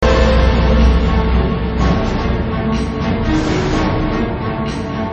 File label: Game Tune